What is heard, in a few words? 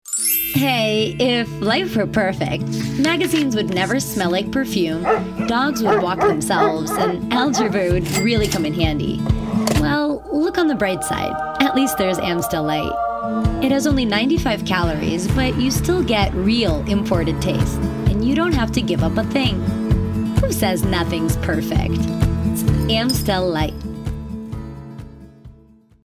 commercial tracks beer sustain